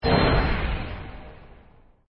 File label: powerup